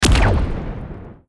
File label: weapon laser impact; steamcommunity; saliengame